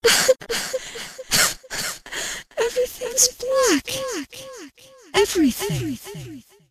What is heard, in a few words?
siren voice